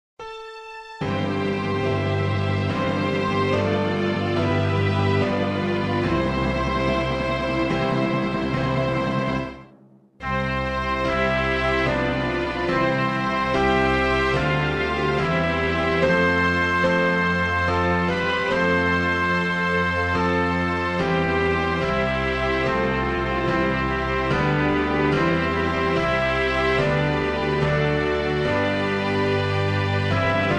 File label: ANTHEM C MAJOR